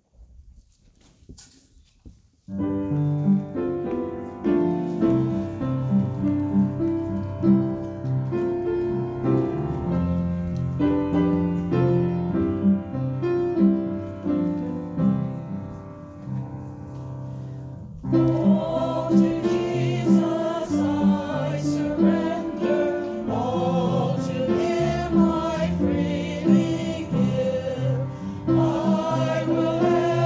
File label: Choir